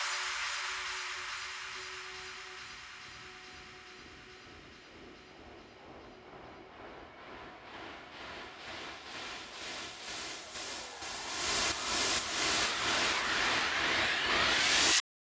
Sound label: downlifter komplett f#; Drum Loop 128BPM; VEC5 Drumloops; VEC5 Multi Loops; Loop Elements